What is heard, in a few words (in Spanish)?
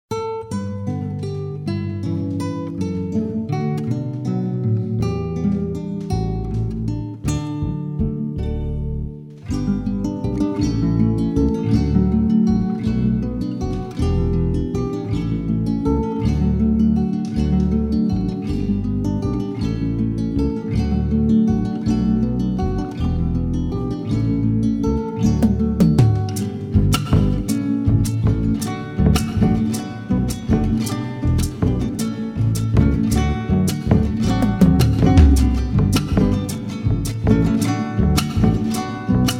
Pista instrumental sin melodia